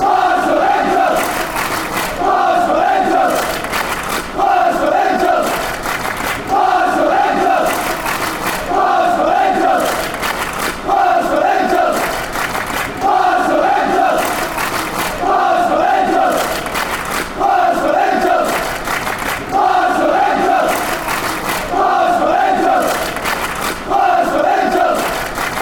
clap, glasgow rangers fan chants

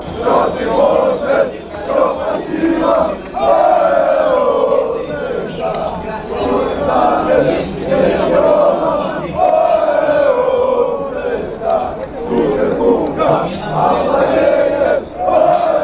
cska chant

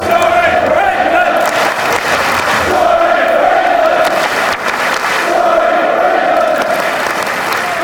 england fanchants; shorey

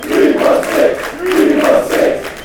west ham chant

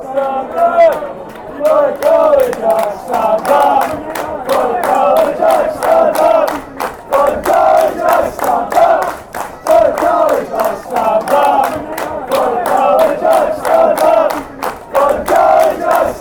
stand caley jags fanchants

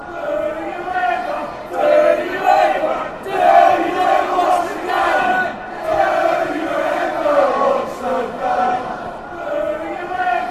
do you ever watch game fanchants

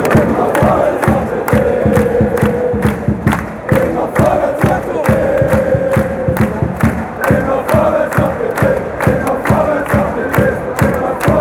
immer vorwarts fanchants

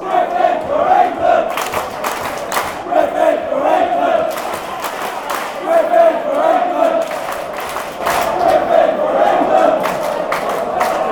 england fanchants; griffin

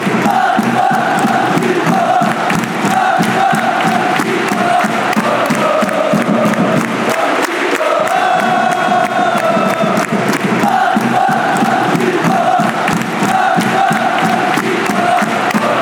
vamos tricolor fluminense fanchants

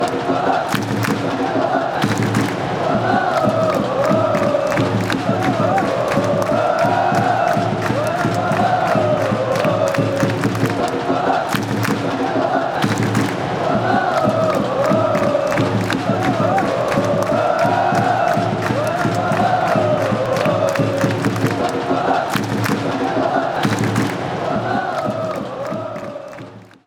tricolor fluminense fanchants

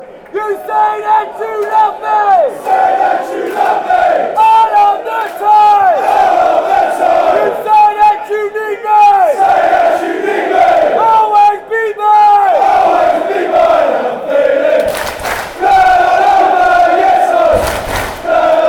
long version fanchants

